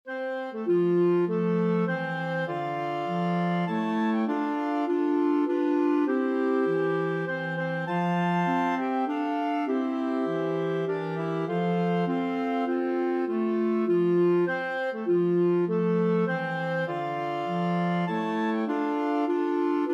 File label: 5 clarinet, clarinet choir